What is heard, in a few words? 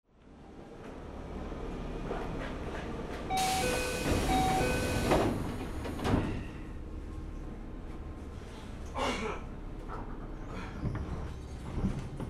Keio 7000; 録音車両：７１０１; 京王八王子→北野; 北野→高幡不動; 高幡不動→聖蹟桜ヶ丘; 聖蹟桜ヶ丘→分倍河原; 分倍河原→府中; 府中→調布; 調布→明大前